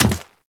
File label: Step Default; Warframe; Ballas Chimera